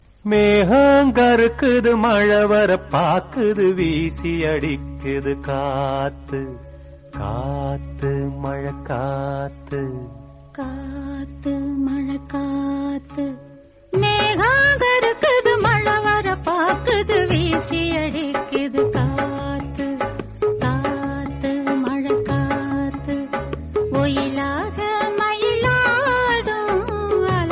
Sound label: tamil song